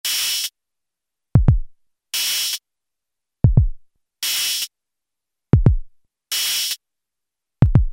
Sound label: Beat Machine, Alesis airSynth